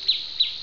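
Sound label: deer critical stop; 250 Desktop Themes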